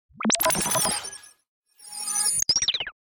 Chopper Feedback Left To Right To Left; 5.1 STEREO MIX; Blastwave

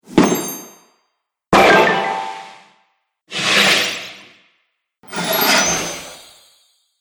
Firework Magic Collection

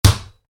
Football bounce, Soccer Ball Bounce On Concrete; Sports

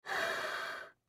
Human Blow On Hands Warm Up; Breathing; Sound Effects:Human

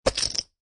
Poker Chip Piles Dropping On Playing Cards; Poker, gambling. Poker chip piles. Pile of poker chips dropping on playing cards; Card Sound Effects

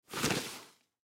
Combat Boots Pick, Combat Boots Pick Up; Shoe Sound Effects; Foley; Blastwave

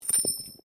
Coin Penny Multiple Fall; Common coins; Money Sound Effects; Money (Sound Effects:Objects)

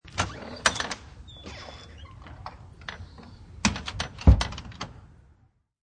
Front door is opened and closed; Miscellaneous Doors (Sound Effects:Doors)

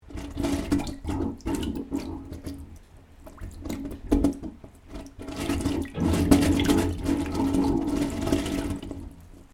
Water Gurgling In Pipe; Weather

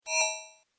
Tech rollover, Rollover: Short, High Tech Effect; Mouseovers (Sound Effects:Multimedia)

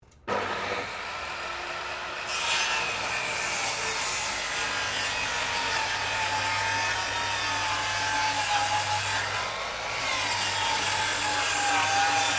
Power Tool Circular Saw Makita Cutting Wood; Various power tools

